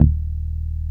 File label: DRAG D#1, BASSES